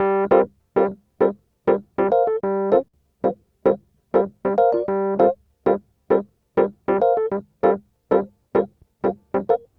Smooth Groove Rhodes, 70s Analog Funk

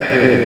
Fx Vox; Equipped Music; Loungin House

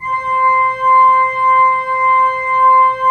3D Pipe Mix Celeste, 3D Organs; E-MU Producer Series Vol. 5 – 3-D Audio Collection

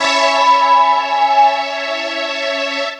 Dream Pad major; Keys; Pads; Drum & Bass

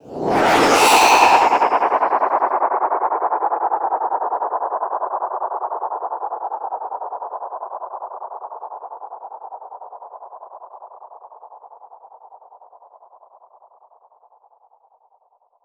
fx vacillate; One Shot FX; Sample Magic; Transmission-X

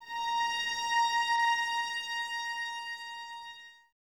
VNS A#5, Violins, String Ensembles; Miroslav Vitous

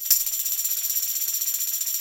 TAM; Sampleheads; NYC Percussionworks; 90 s Sample CDs

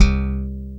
E BASS LO; Back In Time Records; Big Bang; THE ROCK

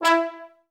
TB STAC E3; Best Service; Peter Siedlaczek's Advanced Orchestra

